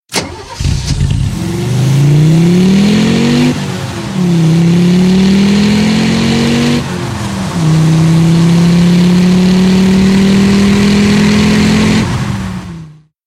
Audi Quattro

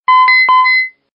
Short Alert Ring

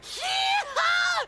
He Haw Scream

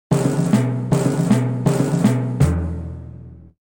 Drum

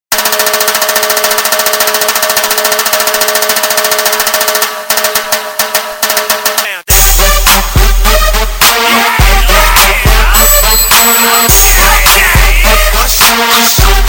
Dhol Vs Rock

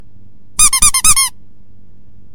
Squeezetoy

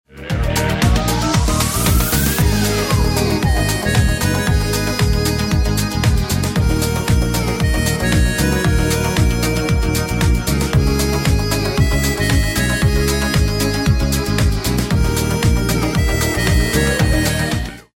Russia Music

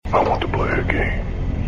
Saw

